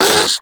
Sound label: Combat Vocal Pain; Warframe; Catbrow